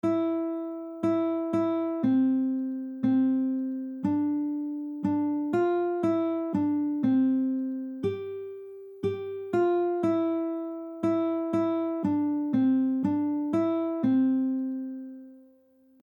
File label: go tell aunt rhody, gitarre; einfache lieder gitarre akkorde